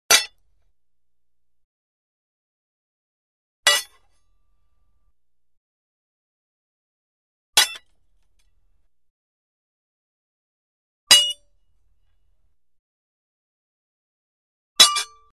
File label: Axe Clinks Dull Light Fast Metal Clank Of Axes; Hollywood Edge Foley Sound Library; Scrap